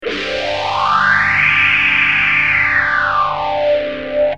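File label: guitar tc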